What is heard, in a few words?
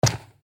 player walk cobblestone loop; footsteps